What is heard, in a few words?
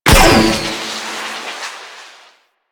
Space Fighter Rocket Fire; Warframe; Enemy Ships; Space Fighter Sentient Coil Agent